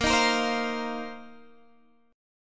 Bb Suspended 2nd Suspended 4th Bb down Guitar Half Step Down